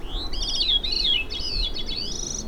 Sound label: Hybrid mix song